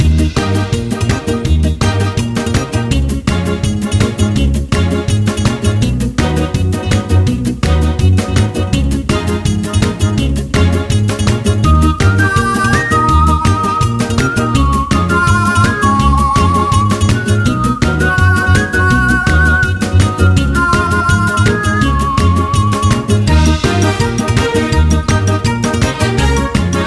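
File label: Roulette Song Loop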